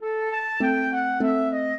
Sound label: minuet, flute harp; Mozart